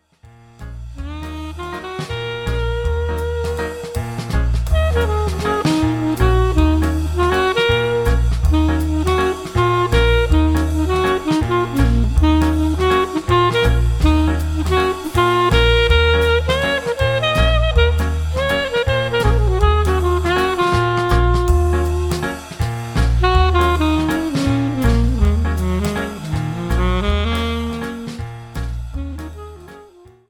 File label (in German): Alt Saxophon